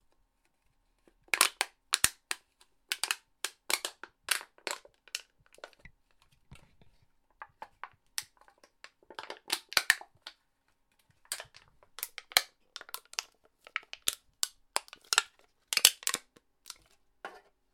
crashed soda can crunch, the distinct, tactile sound of a soda can being crunched